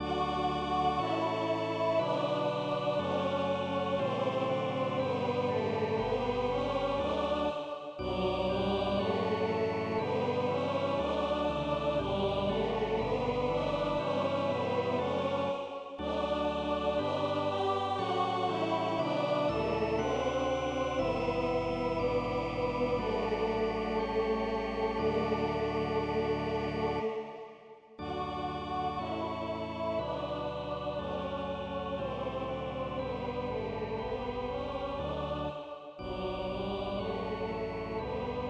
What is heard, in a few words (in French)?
Choral, Re M, Choeur et orgue; Allemand-Francais; Bach; Choral final Passion saint Jean